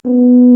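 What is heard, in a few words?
brass tuba; Roland L-CDX-03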